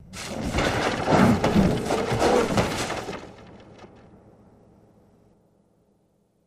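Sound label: Books Fly Through Air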